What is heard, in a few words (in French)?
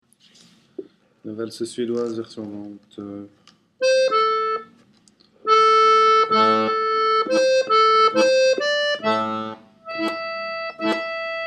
valse suédoise lent, accordeon